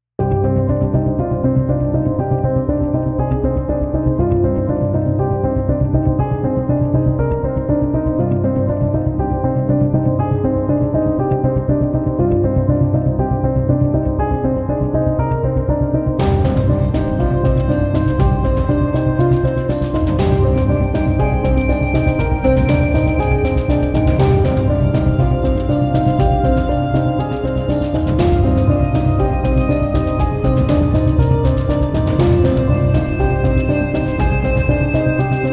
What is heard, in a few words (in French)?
revelation musique enigme decouverte mysterieuse musique